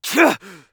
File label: Assassin Grunt Physical; Realm Royale; Shinobi